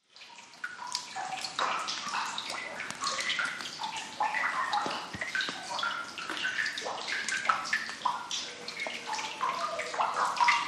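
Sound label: Cave Drip Heavy Water Drip, drips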